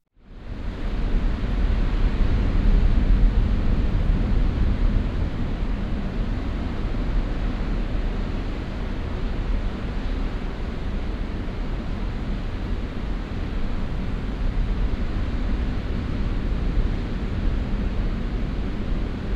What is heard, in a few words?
Waves Cave